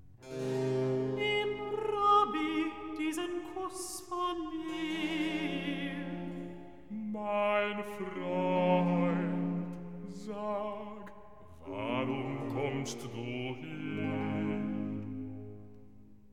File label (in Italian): Recitativo; Nimm, Rabbi, diesen Kuß; Handel; Brockes Passion